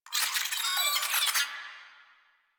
Space Drone Pain; Warframe; Corpus Space Drone

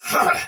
Pain Soldier Sword Agent Male, Battle; Dialog; Barks; Melee; Sinecure

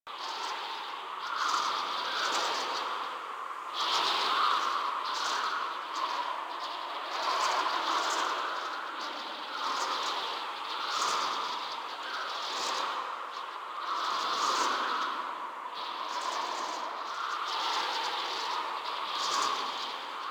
Generic Wind Loop Slow; Vehicles; Archwing; Eidolon

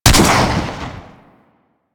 Swarm Missile Launcher Fire Shot; Warframe

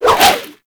Sword And Whip Spin Whoosh; Warframe

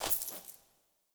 Ur Coin Toss; UI; Minigames

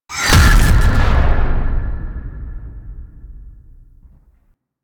Void Storm Hit; Warframe; Railjack

